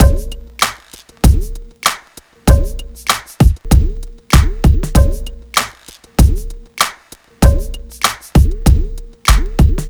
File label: BEAT, Hip-Hop & R&B; USB Soundscan vol.47; AKAI